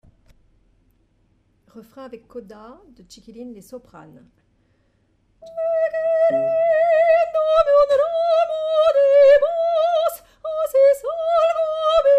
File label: Soprano